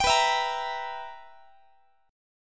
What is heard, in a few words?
B Major 7th Suspended 4th Sharp 5th, Guitar chord; BM7sus4#5; strummed; x,x,22,21,20,21; 4A,4E,5A,5D,5G#,6C#